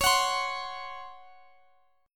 C sharp Major 7th Suspended 4th C sharp, Guitar Standard; strummed; capo=2